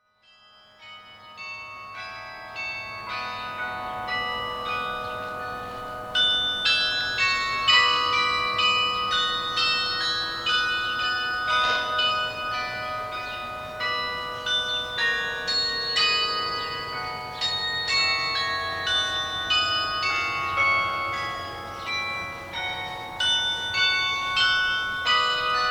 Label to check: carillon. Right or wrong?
Right